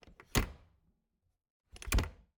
Refridgerator, old fashioned, wooden, steel, brass clasps, hinges, open close, quick, wide; Eiravaein Works; Vaeyan IV